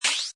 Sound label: Cartoon Charged, Cartoon zip up, sweep or swipe sound; Comedy/Cartoon; Cartoon Sound Design